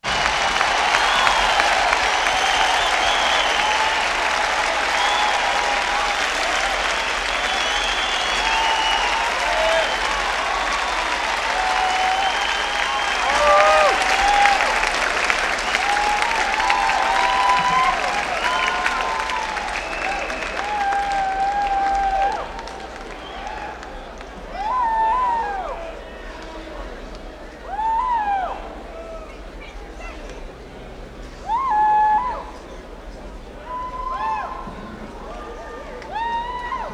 Crowd Applause And Walla; Vocals & Wallas

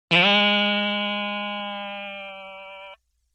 Boing Box Higher; Vintage Cartoon; Hits & Tones

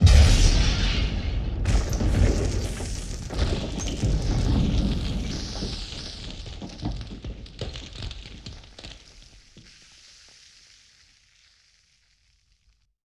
WWII Explosion With Debris; Period Combat

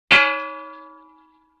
Tire Rim Hit Rattle; Vintage Cartoon; Drums & Percussion

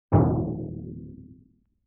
Timpani Hit Bright; Vintage Cartoon; Drums & Percussion

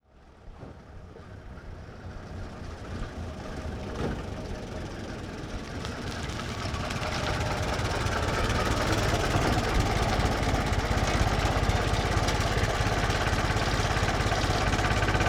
1924 Ford Stake Bed Truck Ext In Medium Idle Off; Period Vehicles

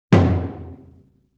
Low Tom Hit; Vintage Cartoon; Drums & Percussion

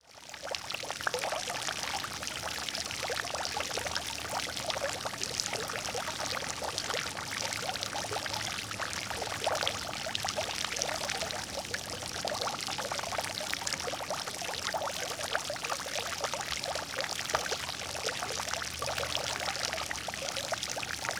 Park With Fountain

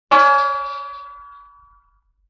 Tire Rim Hit Rattle; Vintage Cartoon; Drums & Percussion

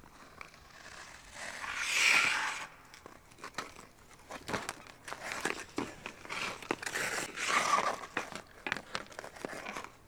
Hockey Skate Movement; Sports & Recreation

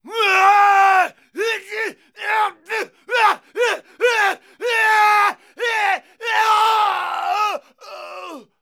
Fighting Yelling Male; Vocals & Wallas

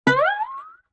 Guitar Plink Slide

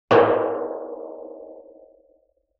Oil Drum Hit Soft Sust; Vintage Cartoon; Drums & Percussion

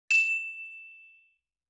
LoFi Metal Chime Hit; Vintage Cartoon

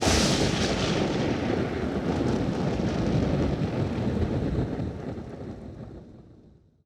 Lightning Strike With Rain; Thunder & Lightning

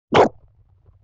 Mouth Hit; Vintage Cartoon; Comedy Vocals

